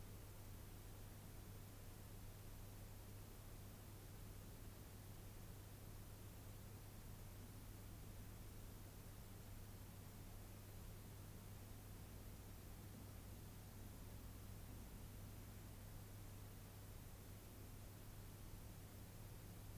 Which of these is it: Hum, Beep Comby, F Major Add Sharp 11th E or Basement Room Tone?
Basement Room Tone